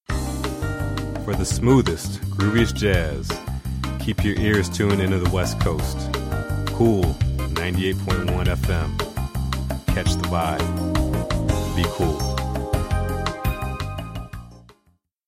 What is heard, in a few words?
jazz